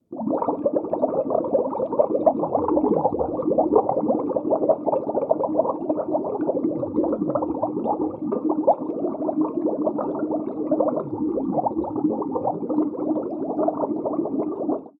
Underwater Bubbles

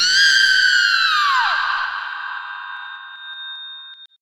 Horror Scream; Slender

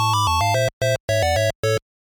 Death Song 8 Bit

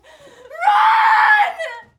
Run

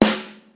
Tama Starclassic

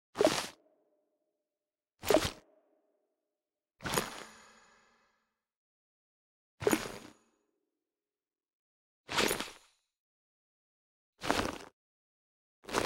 Game Pick Up

